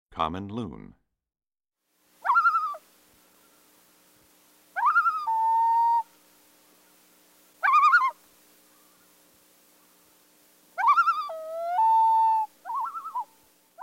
Common Loon, Pied-Billed Grebe, Tundra Swan, Snow Goose, Canada Goose, Brant, Bird Songs; Bird Songs Eastern-Central